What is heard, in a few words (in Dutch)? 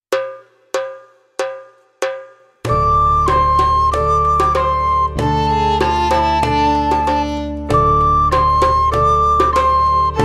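met blokfluit snel; Dromedaris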